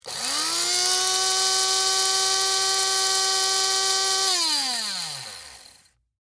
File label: Coffee Bean Grind, Household, Coffee, Bean, Grinder, Run, Small; Appliance